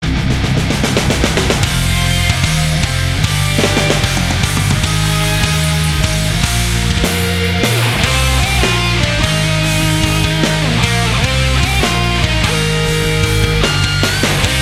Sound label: Final Boss Win, gamerip